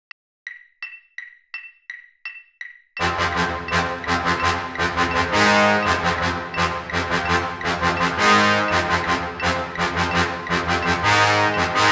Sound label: all winds, marching band